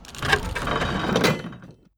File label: mechanism